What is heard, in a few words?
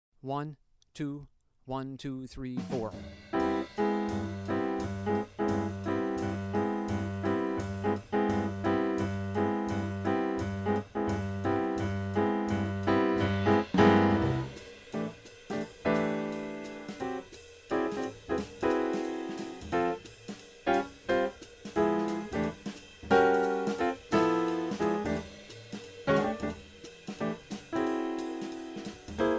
Bass Backing Track